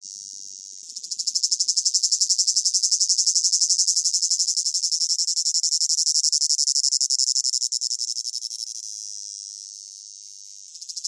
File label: CICADA Close Up Rhythmic Chirping Long Phrases Piercing Whine